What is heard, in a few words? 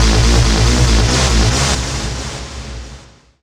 TECHNO EXTRA 140